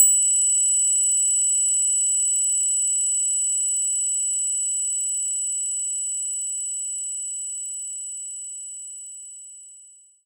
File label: Carillon C6